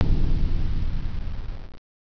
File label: TUBE BNG